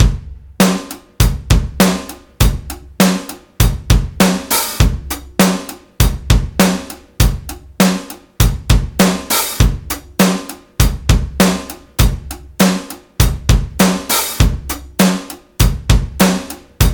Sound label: drum loop rock 100; guitar looper